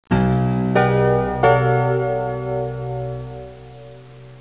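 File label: susp chord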